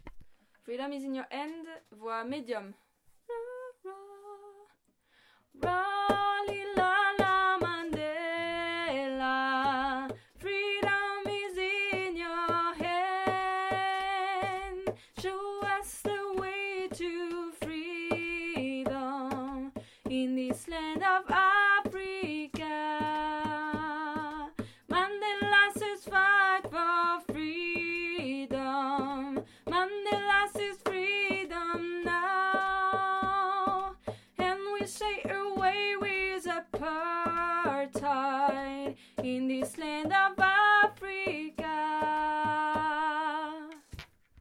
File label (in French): voix medium